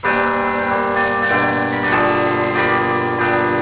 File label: bruges, carillons